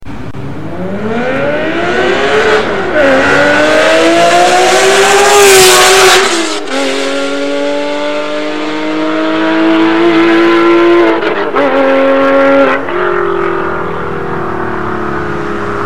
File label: engine car sounds porsche 911